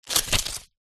household book very small page turn; zapsplat

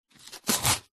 foley polystyrene foam rub scrape short; zapsplat

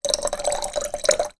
food drink water pour into flask short trickle; zapsplat

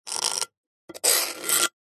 foley rock concrete knife blade scrape scratch; zapsplat

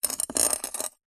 nature ice block sharp knife stab twist

